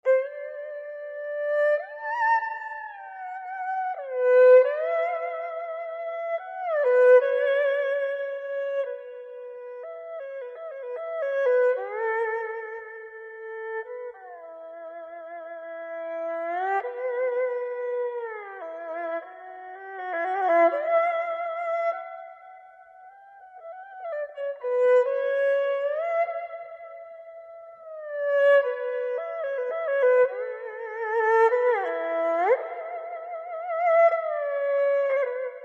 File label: Chinese Classical Music Erhu sad song